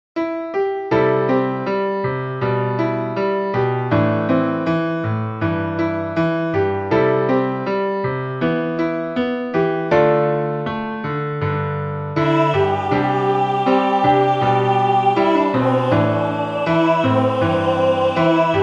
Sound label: Piano Solo